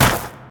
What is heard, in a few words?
gravel; thief